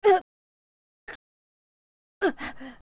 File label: Choke Full; OOT; Young Link; Zelda